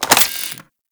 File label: Split Laser Reload Pull Cartridge; Warframe; Corpus